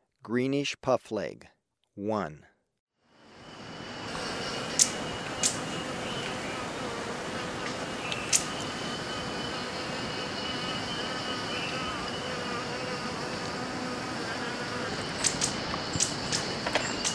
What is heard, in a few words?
Greenish Puffleg, Birds of Ecuador; S. America